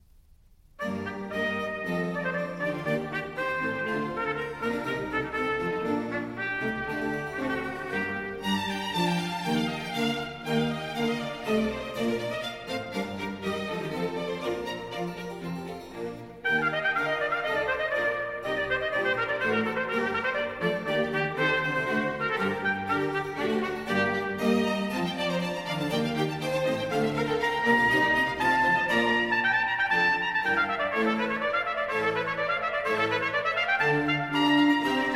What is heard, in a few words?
Trumpet Concerto in G minor, Allegro; Handel